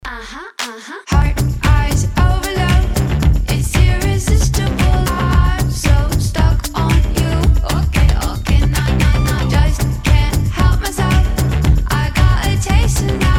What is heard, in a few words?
fire choir